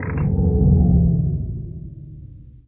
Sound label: sys booster power decrease